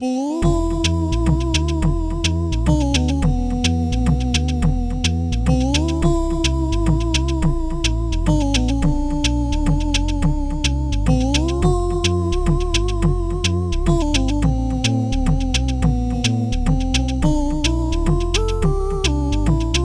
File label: beatvox menu